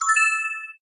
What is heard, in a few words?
combo